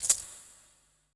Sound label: E SHAKER, ETH PERC